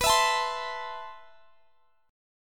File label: C 6th B, chord; strummed